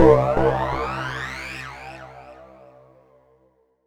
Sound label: C2, SynthLine